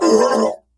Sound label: death, male; bootsector male; midnight guns; bootsector male 00